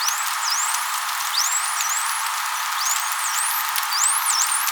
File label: Whistle